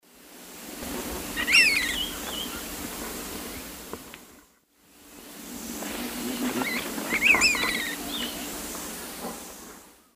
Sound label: Myiarchus swainsoni, calls; S. America; Eastern Lowlands